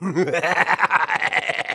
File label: Soldier laugh evil, de; Böses Gelächter 2